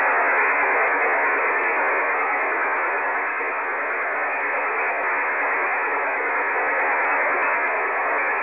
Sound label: digital, shortwave; sdr; adventures in shortwave